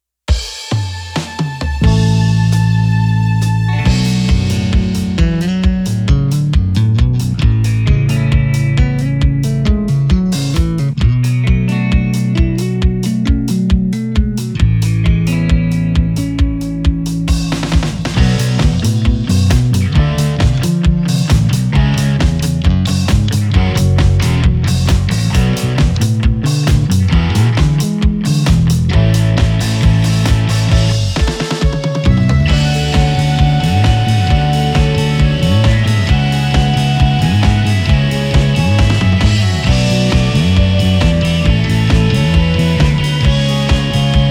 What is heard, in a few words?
Finger Alusonic Django Standard 4; Matte Black